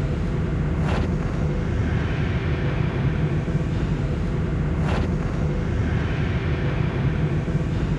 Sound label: toxic muzzle; weapons; Stellaris; toxoids